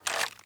clip out; Rust

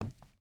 footstep hide wood walk; Rust

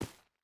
footstep burlap stones run; Rust

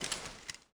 foley walk

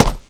stone run

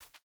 footstep burlap concrete jump start; Rust

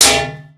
tank hit small; tanks; War Thunder

